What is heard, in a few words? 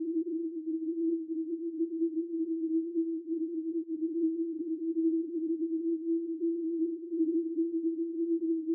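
analysis lorikeet chirps gain, Rainbow Lorikeet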